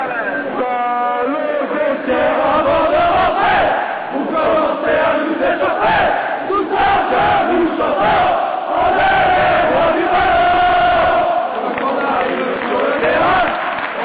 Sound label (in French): Chant Mythique Allez les verts